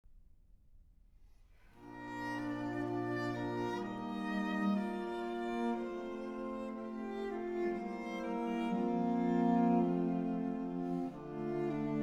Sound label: String Quartet